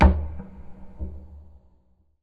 move

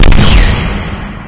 psp fire, weapons

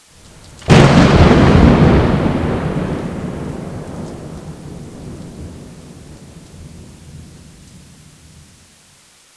thunder

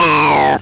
pain; votemap; neko overdrive

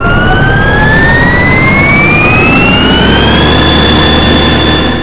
power on; ambience

